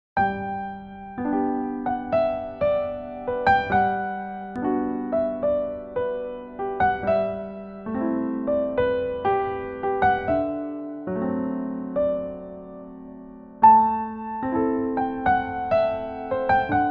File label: Piano Version